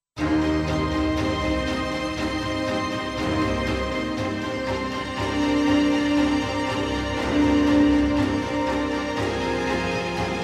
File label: Winter Strings; TO Animated Orchestra; Kingdom of Ice